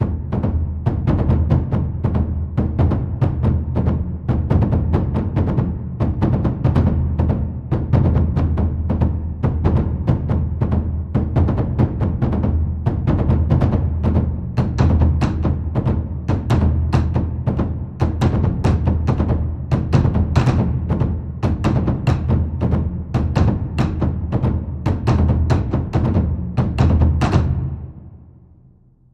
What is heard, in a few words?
Loop Layer Taiko